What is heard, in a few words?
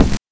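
BRK BD, bass drum; NES DPCM; MIDINES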